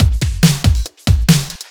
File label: Loops BB 140, BreakBeats; Vengeance Samples